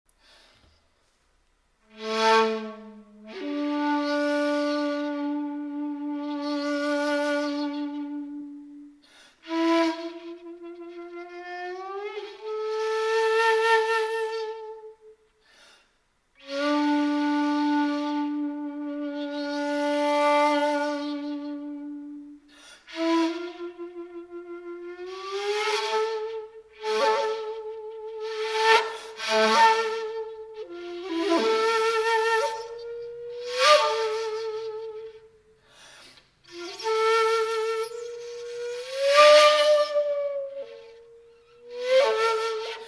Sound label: music Shakuhachi; SilkRoad